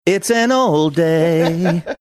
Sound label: singing